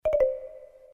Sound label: segment cleared; squix